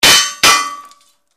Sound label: Bullet Impacts Metal; Solid Metal Impacts. Series Of Two High Pitched Metallic Clangs. Anvil Like. Close Perspective.